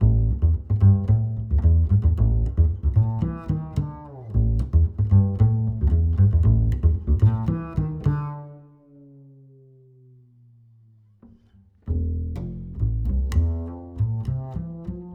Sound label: Ac Bass 25cm C Plucked Mid